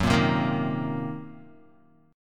Gb 6th Flat 5th Gb down Guitar

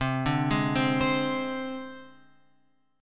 C Suspended 2nd Sharp 5th; Csus2#5 Chord {8 5 6 5 X 8} arpeggiated

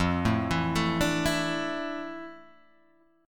F Major 13th, chord; arpeggiated; JGuitar

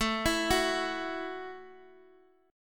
A 5th 6th A, guitar chord; A56 Chord {17 19 16 X X X} arpeggiated

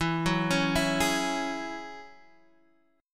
F sharp 7th Suspended 2nd E

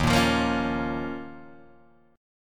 D sharp 7th Suspended 2nd D sharp, Guitar, DADGAD

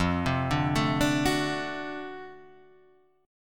D 7th F, guitar; D7/F arpeggiated; JGuitar

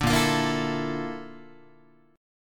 A sharp Major Sharp 11th, Guitar; A#M#11; strummed; JGuitar

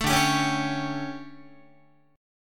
D Major 7th Suspended 4th, Guitar; DM7sus4 strummed

